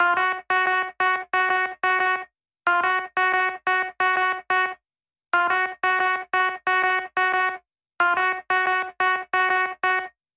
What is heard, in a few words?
Hook